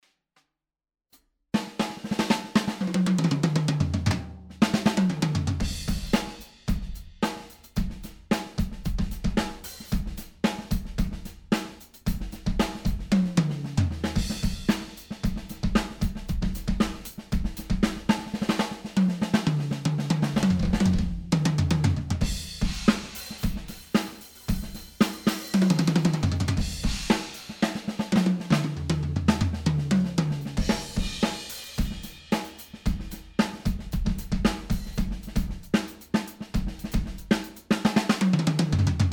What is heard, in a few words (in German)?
Set1 mit 14˝x 5 5˝ Snare; Fame Crystalicca